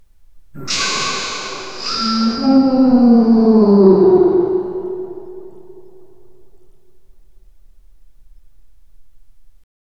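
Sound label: man slides down rough cave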